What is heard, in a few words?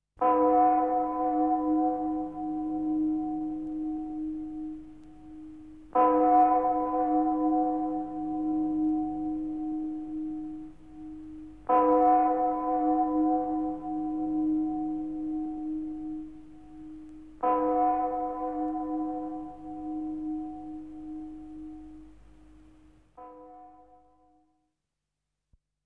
Bells; Inquisitor 2